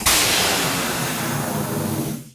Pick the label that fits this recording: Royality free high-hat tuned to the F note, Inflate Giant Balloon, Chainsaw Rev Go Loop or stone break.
Inflate Giant Balloon